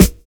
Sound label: DISCO SD, SNAR; USB Soundscan vol.11; AKAI